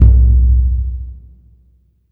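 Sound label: Burundi drum, Heart of Africa; Spectrasonics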